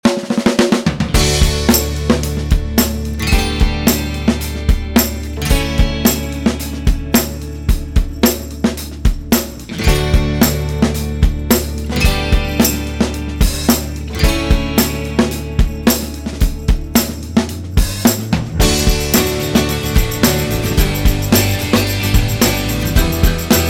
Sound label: light rock; lightrockpop